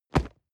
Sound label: nylon leather bodydrop hard